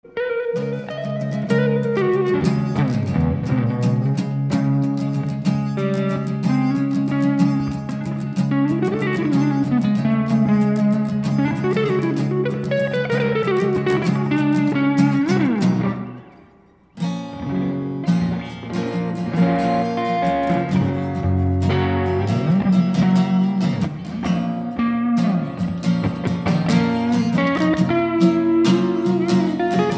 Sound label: instrumental